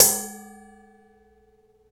BOWL, INDUSTRIAL; Roland - LA Composer vol. 1

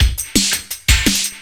loop, 170 BPM, drum & bass

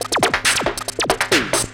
drm 138, Techno, drum-loops; Techno ID; modular-fiction

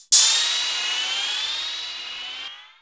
PROCYMB, CYMBALS; USB Soundscan vol.20 - Fresh Disco House I

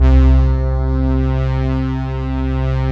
SYN, SYN Analog; Sound & Vision; Gigapack I CD 2

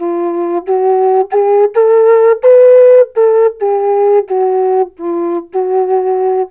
SERULING, Heart of Asia

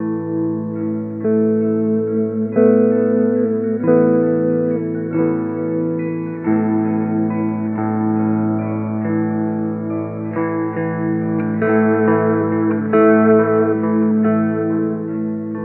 Psilosonic Guitar Swim; Sonic Foundry (Sony Creative Software); Ma Ja Le Ethereal Textures

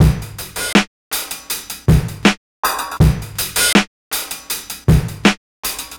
Street Tip, R&B Drums

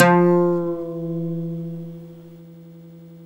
OUD GLS DWN E, Mediteranean Traditions

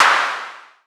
snare mod

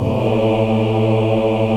VOX African Ahs; Roland LCDP11 Africa VOL-1

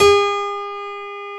g4-a#4, piano, grand; Giga Samples Collection; ARS Grand

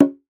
CLAY BONG, African Perc; E-MU Formula 4000 Series Vol. 4; Earth Tones

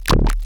weird bass

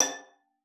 A6, VIOLIN SPICC; Xsample VOL-5

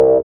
SYN, dance; Sound & Vision - Gigapack II CD 1

